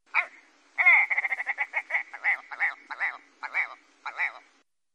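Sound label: willow ptarmigan voice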